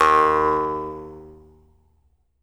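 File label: Jaw Harps, Pitched Percussion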